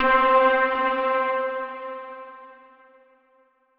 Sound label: chime; chime sharp